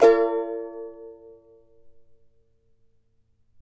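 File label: CHARANGO, B major, up; Spectrasonics; Hans Zimmer Guitars VOL-2; CHARANGO CHD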